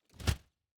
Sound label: building destruction stinger